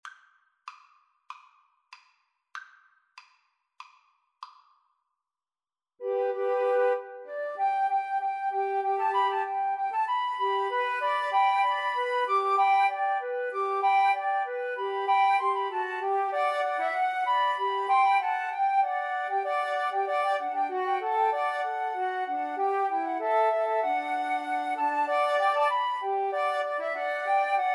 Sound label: rejouissance, Flute Trio